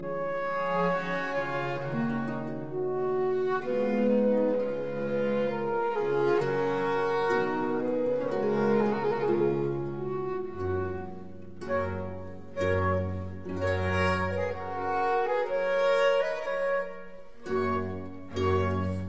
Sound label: Quartet Adagio; Quatrieme livre de 6 quatuors; Quartet No 4 in C major; TWV 43C1